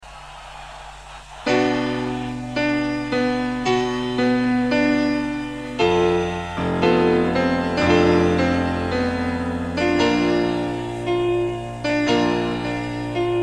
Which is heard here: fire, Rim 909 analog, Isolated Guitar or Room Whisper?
Isolated Guitar